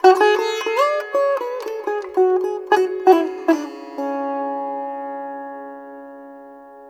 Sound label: SITAR; Spectrasonics; Heart of Asia